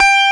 G4 TELECASTR, Bass Guitar; AKAI S6000 CD-ROM - Volume 1

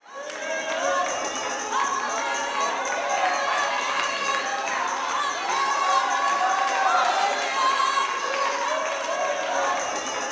WORSHIP, Gospel

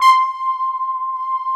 SYNTH X LD, TECHNO; Pro-Rec - Synthsonic; Z1 TECHNO

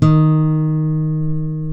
D#2 FLAMENCO, GTR; AKAI S6000; WorldSounds

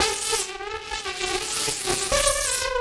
Mod B, 170 bpm, A, modular; modular madness

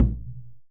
CHANG GO, KOREAN DRUMS

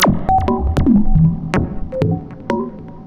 Mod Loop, Modular Percussion

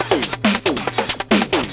drm 138, Techno, drum-loops; Techno ID; rhythm

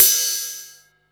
hat, Techno 138 BPM

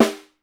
SN, Percussion; Miroslav Vitous - Percussion & Harp

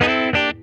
C9 SLIDE, Funk Guitar; Zero G - Funk Guitar

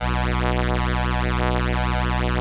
ELKA, String Machines

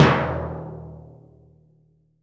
timp C2, timpani; AKAI S6000 CD-ROM - Volume 5

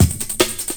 rhythm, break beats

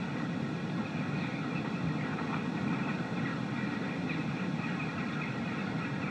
Ambience Loop, outdoor

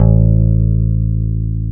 FEND P-BASS A1; Sonic Reality - Vintage Timetraveler

